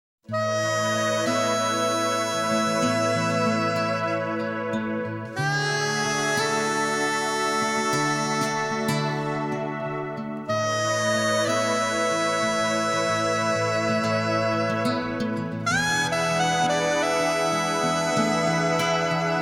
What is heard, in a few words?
Love Theme -Gut Guitar Version-; Rurouni Kenshin Meiji Kenkaku Romantan; Kamiya Kaoru